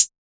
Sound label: Closed Hihat; Champion; Cymatics; Mystery Pack Vol 4; Drum One Shots